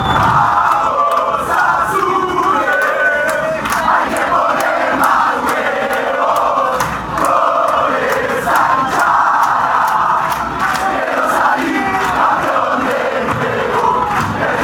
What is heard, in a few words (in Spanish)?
millonarios chant; mfc